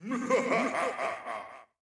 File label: idle, evocation illager; PureBDcraft; minecraft